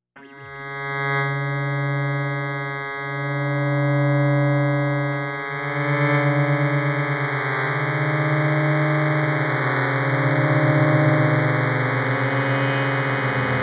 fat modular

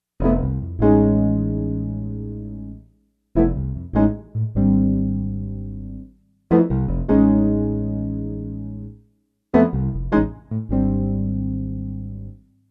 base fragment

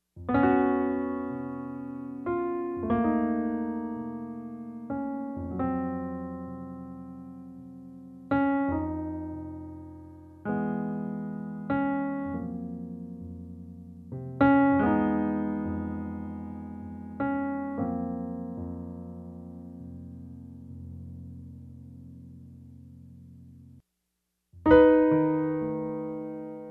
mazurka in f

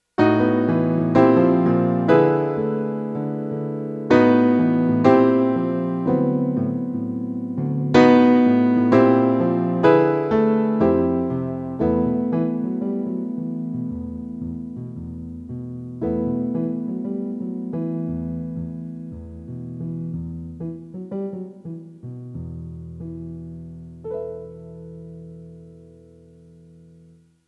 fragment in e minor

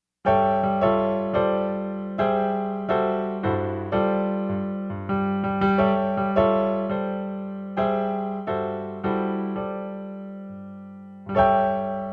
bells

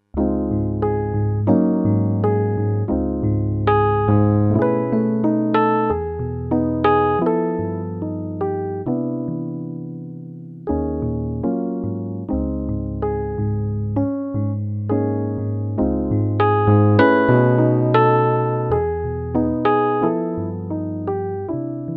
ballad in Db major

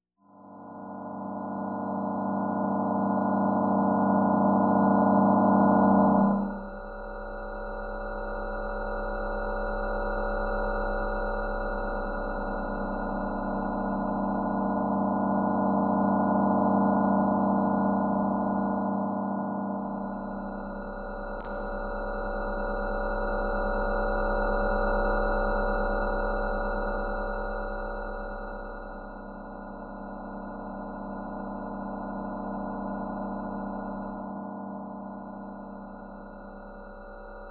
table saw pad

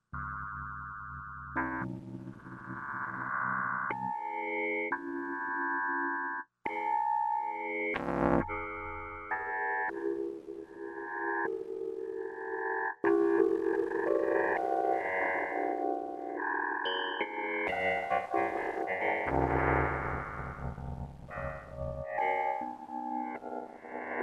cough drops

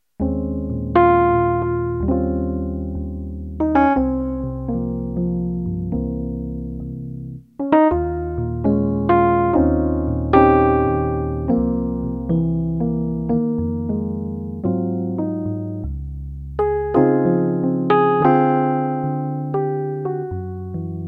ballad in Ab major

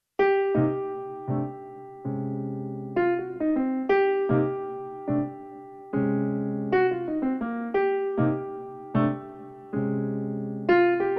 C minor blues

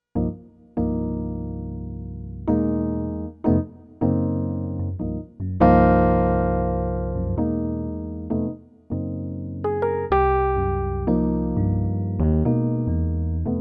fragment minor blues

